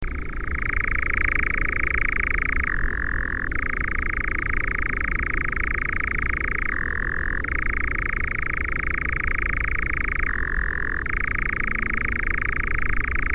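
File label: obyknovennyy kozodoy golos, common nightjar voice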